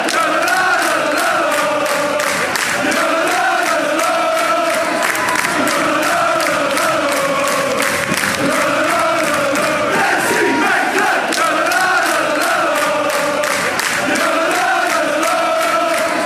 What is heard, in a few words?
ooooh; racing genk